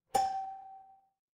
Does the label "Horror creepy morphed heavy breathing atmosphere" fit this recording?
No